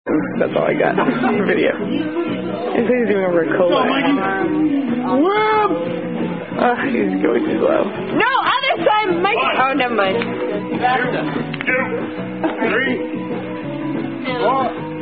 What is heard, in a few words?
Dizzy Stick Drinking From Bat And Swinging Bat